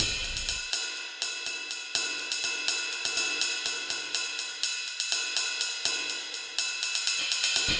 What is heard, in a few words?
Cymbal Ride; Sonic Foundry; Rondo Brothers Trip-Hop Loops & Samples